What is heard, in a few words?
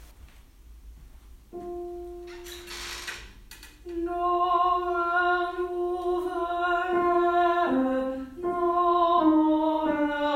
3e stem